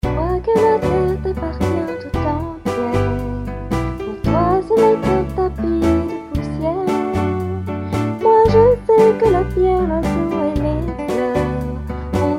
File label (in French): L air du vent Sad; version "triste"; Projet Songsmith